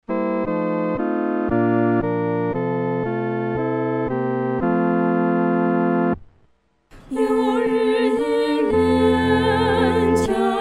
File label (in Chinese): 合唱 四声部